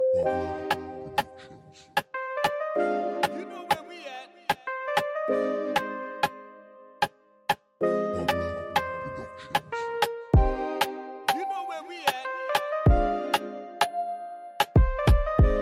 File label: Latin Reggaeton